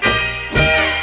guitar

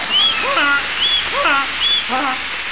donkey

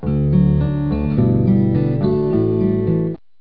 guitar intro

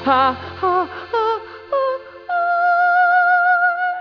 hahahahahaaaa

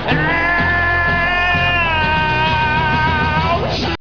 owwwch

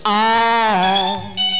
ahh

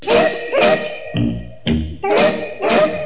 boop boop; "Mystery Sound Bite" Contest

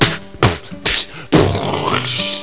mouth fireworks

